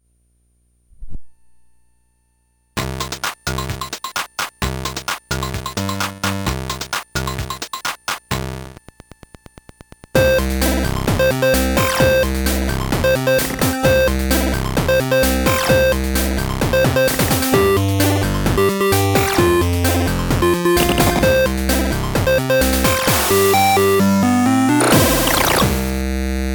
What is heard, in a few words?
light light on